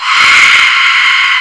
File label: air